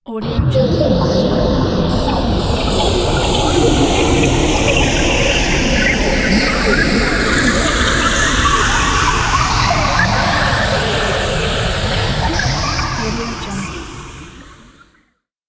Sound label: bottom of the sea